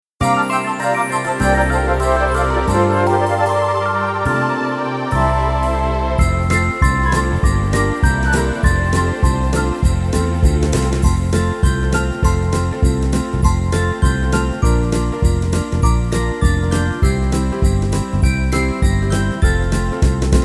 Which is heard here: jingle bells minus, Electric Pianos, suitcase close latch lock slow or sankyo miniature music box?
jingle bells minus